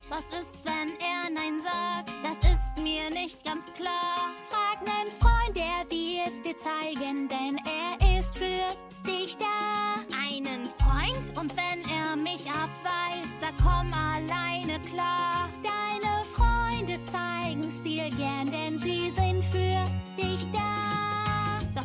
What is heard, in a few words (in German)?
Gesang Kinderserie Eislaufen